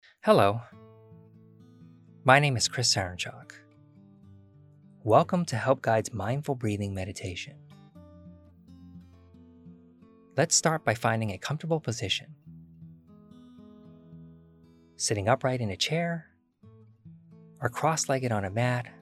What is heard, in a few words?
mindful breathing meditation with music